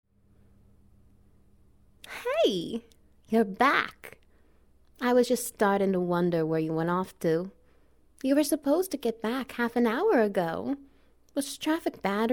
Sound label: Goblin Wet Noises; Monster Exchange Program